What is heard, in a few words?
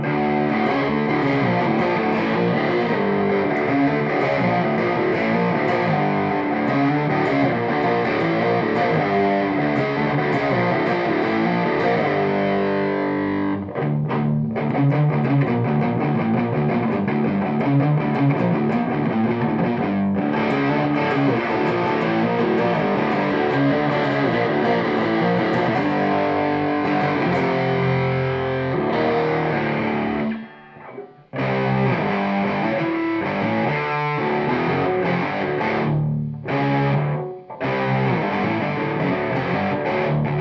strat with amp; showmaster sss